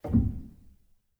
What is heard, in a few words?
rel, Grand Piano; bitKlavier Grand; Piano Bar